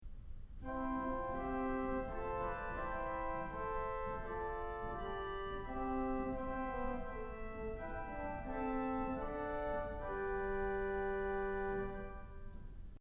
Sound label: Gambette; Kellogg Auditorium